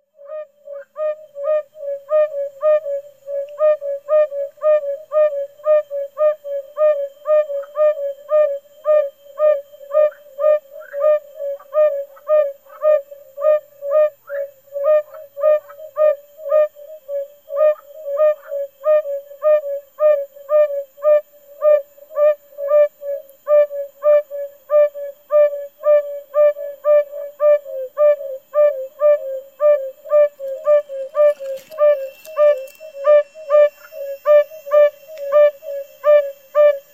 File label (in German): Bombina variegata Gelbbauchunke